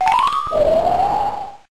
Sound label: Fairy Wind